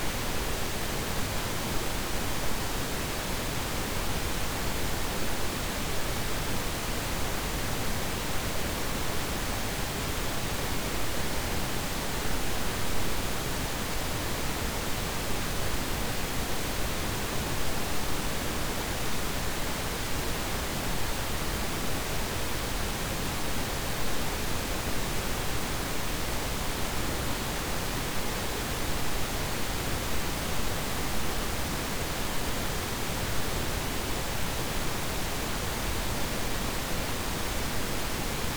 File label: pink noise